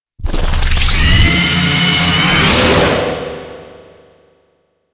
Drone Fly Away; Warframe; Vay Hek

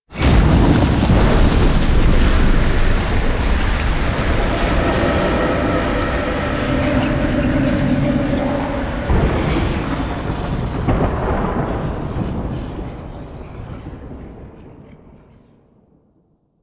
Reveal Stinger; Warframe; Man In The Wall

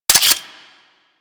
Grenade Launcher Mech; Grineer

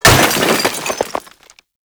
Barricade Destruction Small; Assassinate

